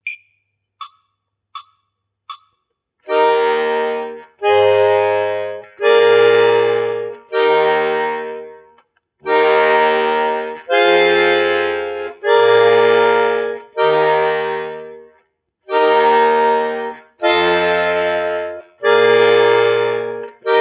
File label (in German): kadenzen und akkordfolgen